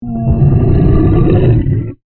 Monster Groan, Horror, Monster, Groan, Creature; Monsters (Sound Effects:Horror); BlastWave

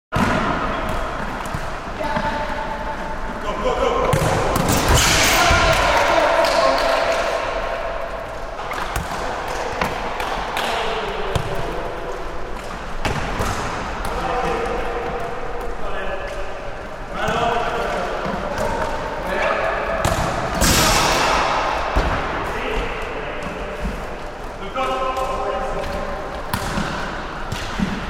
Five-a-side soccer team playing indoors.; Sports

